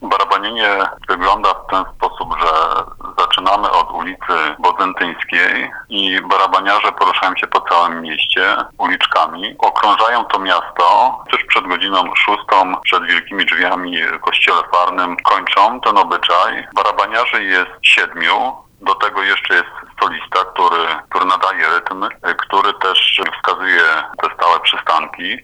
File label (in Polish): barabaniarze